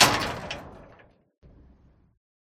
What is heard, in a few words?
Closet close; Five Nights at Ronald's